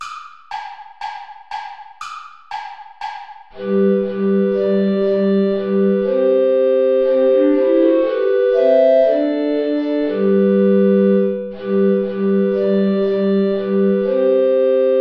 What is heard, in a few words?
play along without soprano recorder; steuerlein johann; mit lieb bin ich umfangen